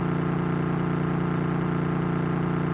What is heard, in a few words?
engine; Mario-Kart-3.js